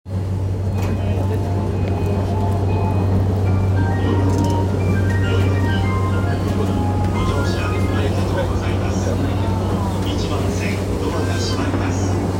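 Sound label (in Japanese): 山手線代々木 clockwise, train sounds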